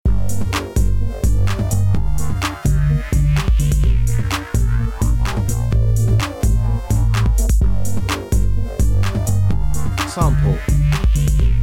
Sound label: electro, backing